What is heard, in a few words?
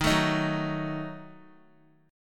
D sharp Diminished D sharp, Guitar; D#dim strummed